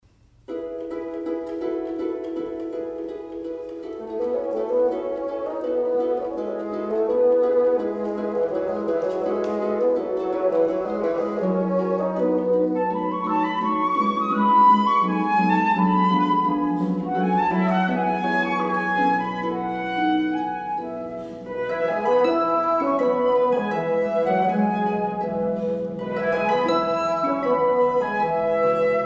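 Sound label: dialogue fl bsn, flute bassoon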